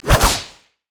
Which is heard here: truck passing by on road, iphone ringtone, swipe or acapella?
swipe